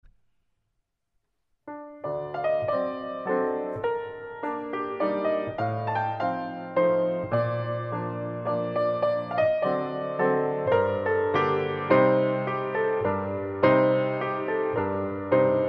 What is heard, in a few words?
Mazurka G Moll; Chopin